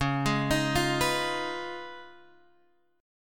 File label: C sharp 9th C sharp, chord; C#9 Chord {9 X 6 8 6 7} arpeggiated